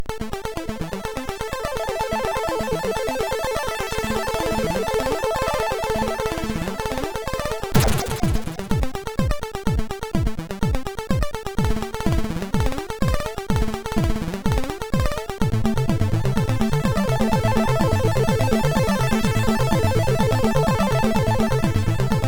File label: Damage, MOS6581R4